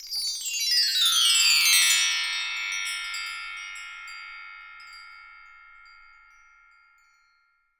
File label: BRASS M, TREE BELLS; Voice Crystal - Steve Reid Definitive Percussion